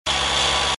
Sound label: Spinner; mega boulder rampage gamerip